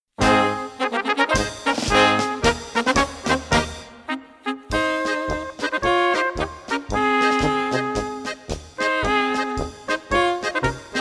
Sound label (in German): Marsch